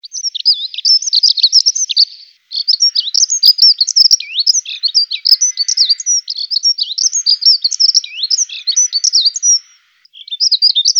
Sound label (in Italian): Passera Scopaiola, Uccelli; Suoni